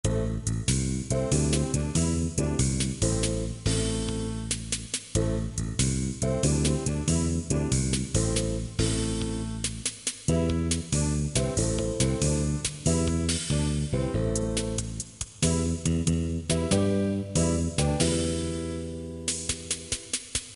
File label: bgm